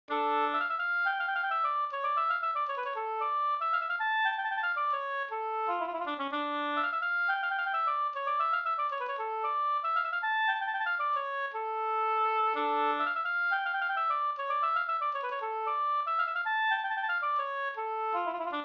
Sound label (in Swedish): polska Bodapolska